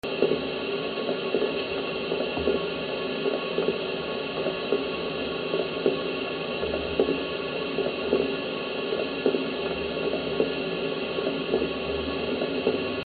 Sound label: St George terrace copper service with meter turning